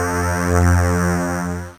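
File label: synthesizer Dance; Best Service Gigapack 1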